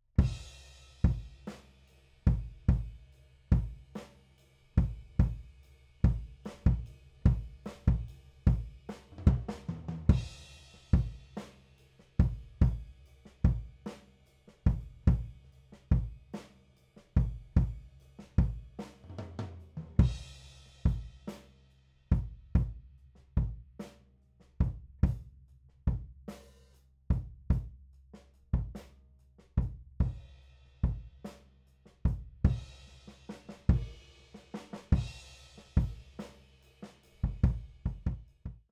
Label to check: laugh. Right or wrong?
Wrong